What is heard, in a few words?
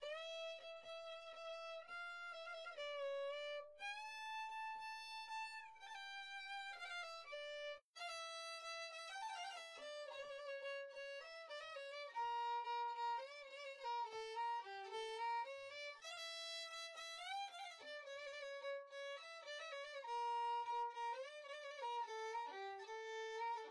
Viola Man